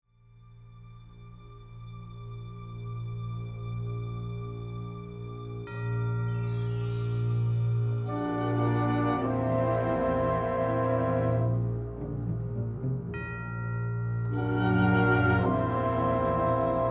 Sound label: Music